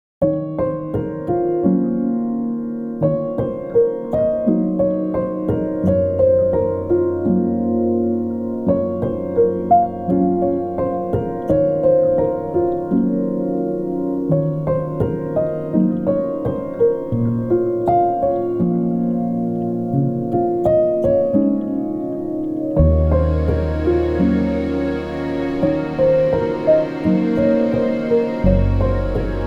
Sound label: Into The Forest Underscore, Icelandic Indie, serious cinematic; Narrative Mind